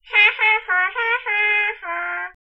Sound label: Hahaha